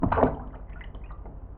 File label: swim; ludum dare 48